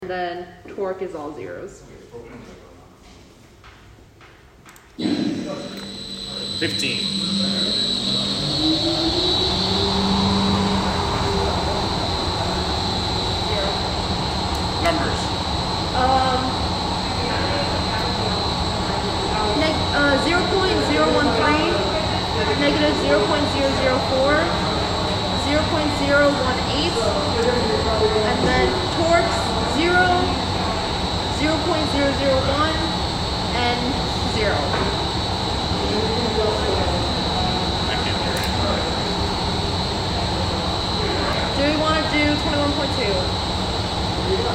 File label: Wind Tunnel